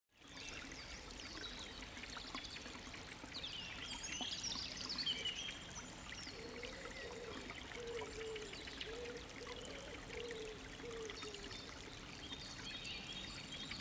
river small calm birds

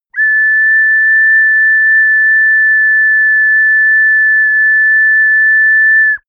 ocarina unstable high long

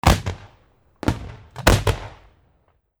fireworks multiple shorts

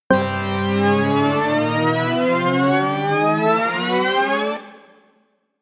charge

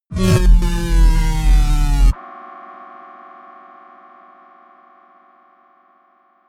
synth drop

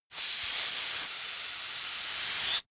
balloon air release

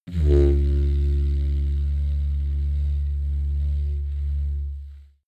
didgeridoo soft tone

